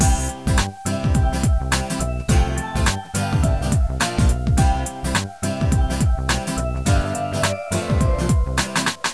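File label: cart background music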